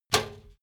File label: trash basket metal hit rattle high